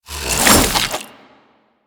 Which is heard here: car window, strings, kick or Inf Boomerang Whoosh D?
Inf Boomerang Whoosh D